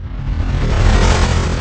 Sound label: zoom in; chess; java apps chess